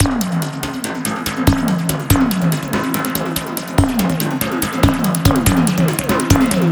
star machine beat; FRENETIC FRACTAL GROOVES

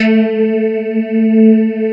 STRIA, Twisted Textures; STRYNGI

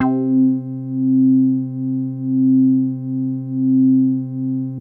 Big Synth Basses

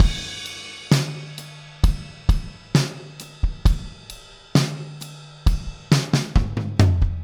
Chorus, Full Mix, Drums; Sonic Foundry (Sony Creative Software); Drums from the Big Room The Mixes; Demo Projects

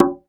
Dance Drums

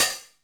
RM1X Drum Anlg Kit HH Op Hard H; Yamaha RM1X

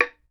BAMBOO, World Assortment

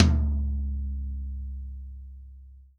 REAL DRUMS POP KIT

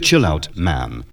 VOX GENERAL; AMG Megabass REMIX! VOL-2

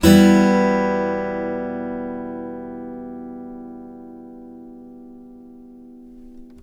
UP, Blues Guitar; ILIO; Fretworks

